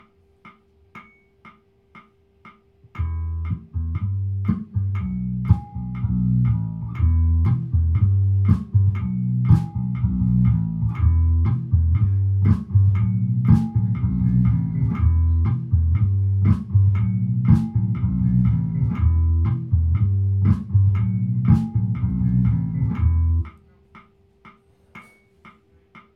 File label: Loop